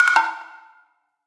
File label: notice; freetel